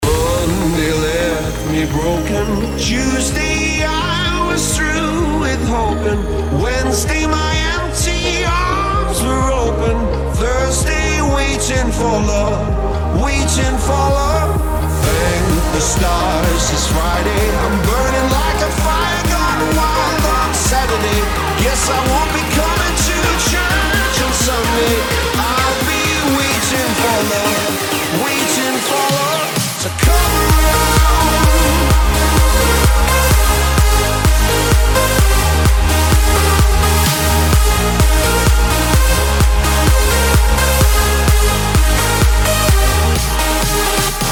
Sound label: remix, Progressive House; Savage Sounds - The Ultimate Emotional Progressive House Bundle